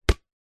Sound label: soccer ball slightly deflated single bounce slightly wet asphalt concrete; sport